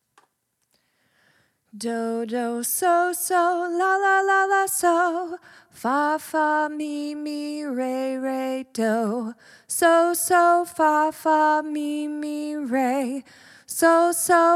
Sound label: baa baa solfege